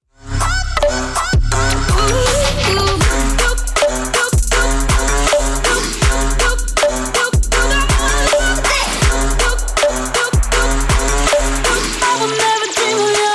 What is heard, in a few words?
lawnmower